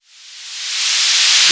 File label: Sweeps Medium